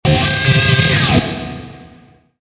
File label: rock riff; camp rock; rock n row